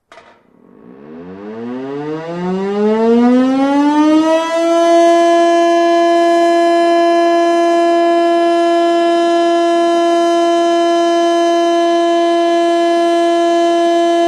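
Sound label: Nagaizumi siren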